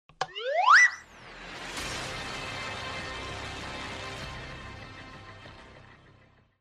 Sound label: match 3